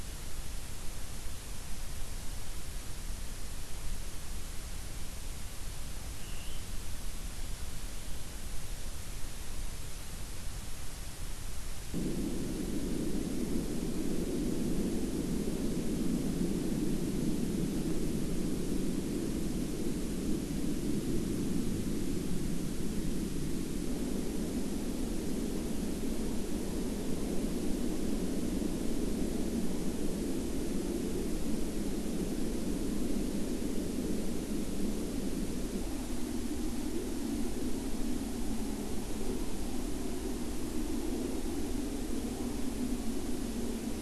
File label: WFR audio emfisis